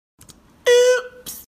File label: oops